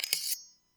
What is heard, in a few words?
machete vs spatula